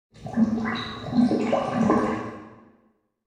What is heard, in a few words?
Room Shower Drain Glug; Prison Architect